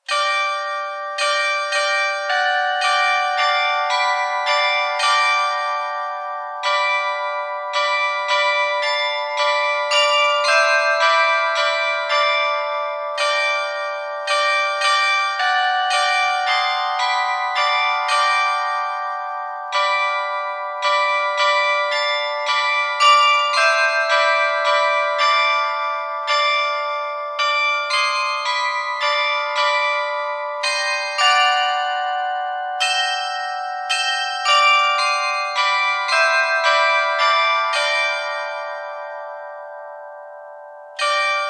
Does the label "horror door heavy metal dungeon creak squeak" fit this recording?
No